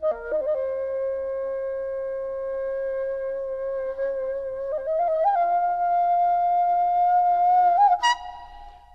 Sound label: daegeum; Korea; chung sung gok